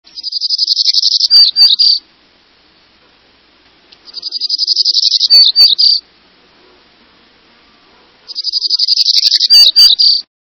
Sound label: Hermit Warbler, songs